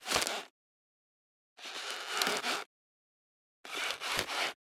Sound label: thin ice creaks; sonniss gdc